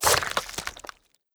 flesh; Rust

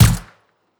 smg attack silenced; Rust

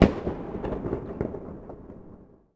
salute; World of Tanks Blitz; hangar 9 may

